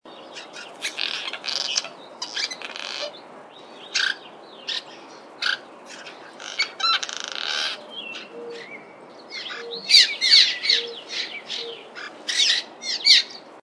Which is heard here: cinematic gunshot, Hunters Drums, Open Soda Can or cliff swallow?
cliff swallow